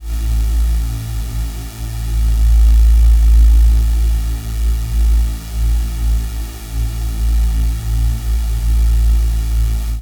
sq sin mix

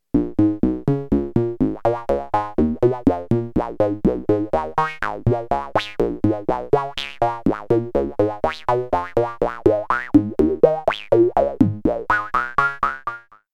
square

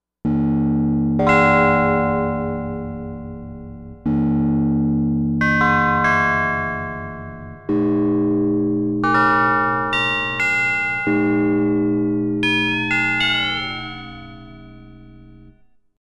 fm bells

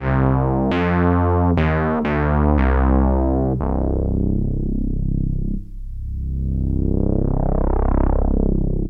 moog

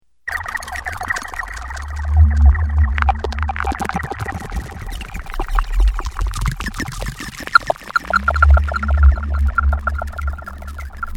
glorp

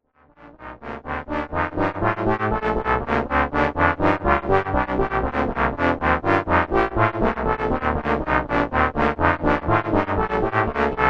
filters

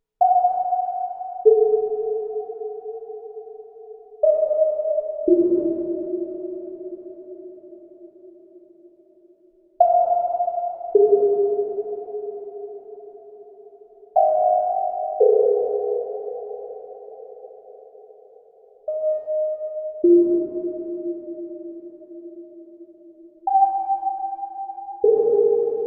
E330 Cloud Mode Sine Plucks

